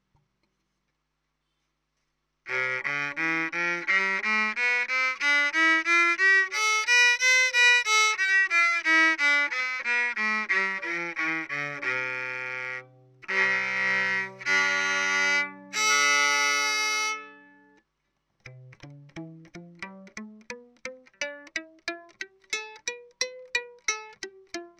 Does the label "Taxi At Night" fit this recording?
No